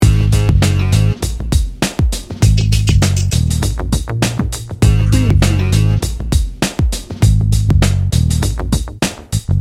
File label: hip hop bounce loop